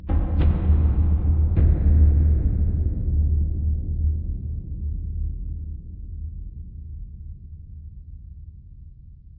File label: Tymp